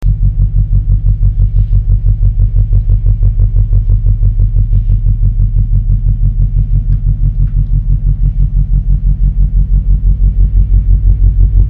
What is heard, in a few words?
Standbeat